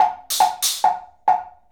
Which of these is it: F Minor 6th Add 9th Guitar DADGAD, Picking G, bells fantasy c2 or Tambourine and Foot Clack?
Tambourine and Foot Clack